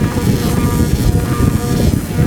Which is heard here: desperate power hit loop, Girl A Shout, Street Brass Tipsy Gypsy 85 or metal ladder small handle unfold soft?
desperate power hit loop